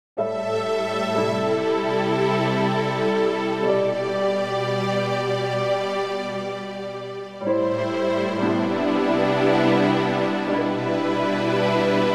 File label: Strings Harp